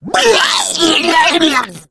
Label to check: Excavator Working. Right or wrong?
Wrong